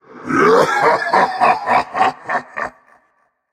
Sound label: laugh; smite; arthur